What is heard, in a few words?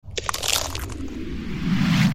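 cookie clicker ascend sound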